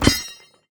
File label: death drop sickle; smite; osiris